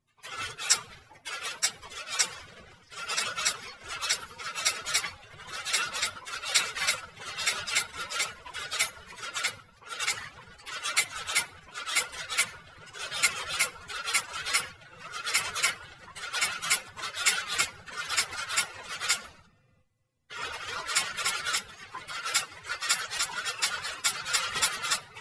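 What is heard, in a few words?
typewriter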